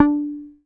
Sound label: APX D4, bass; Sampleheads; Will Lee Bass Library